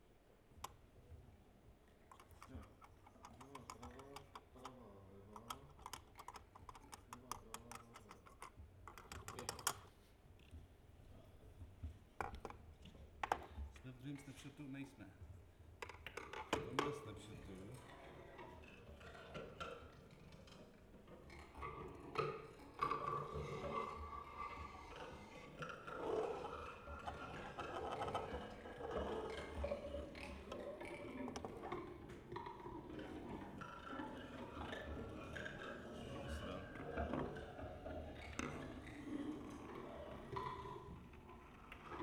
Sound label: Soundcheck; Brno; Lexikon-Roman